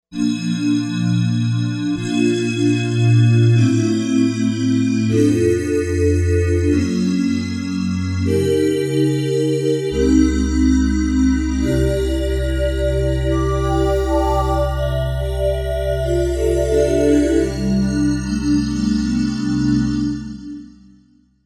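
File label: Glass Man, synth pad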